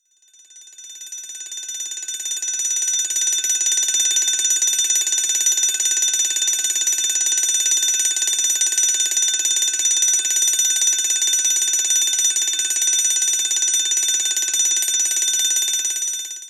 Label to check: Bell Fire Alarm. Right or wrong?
Right